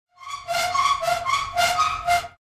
Metal squeaking from movement

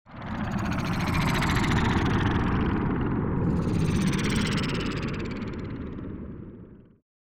Spaceship pass by alien craft

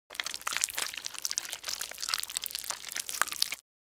Cartoon slime bubbling wet gloop movement

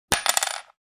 Single coin dropping on solid surface

